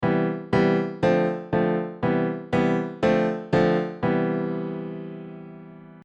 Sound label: Ionian prog; Modal Harmony; Modal Harmony: An In-Depth How-To Guide